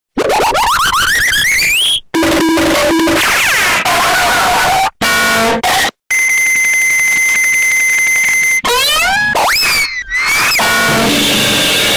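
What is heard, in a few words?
Goofy ahh sounds earrape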